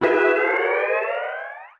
slide

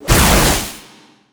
lightning strike; weapons; dota; razor

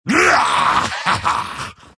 troll laugh; dota; troll warlord

